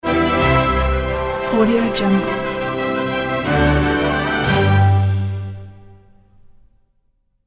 magic fanfares ident

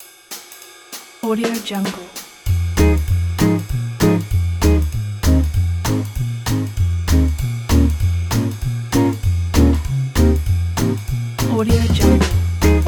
big band night show

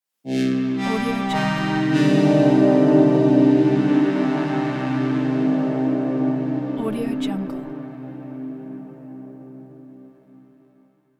mystery logo

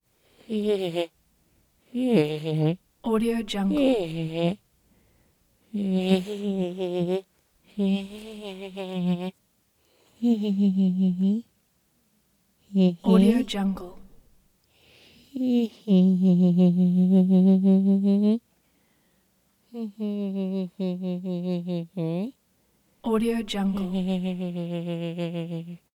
creature voice experiments nervous quiver

